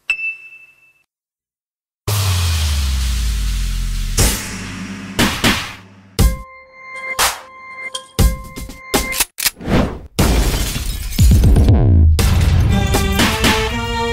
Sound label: skeleton floor music